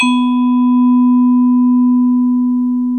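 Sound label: TONE B2, vibraphone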